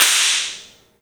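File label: clap short; E-MU Producer Series Vol. 5 – 3-D Audio Collection; 3DSprints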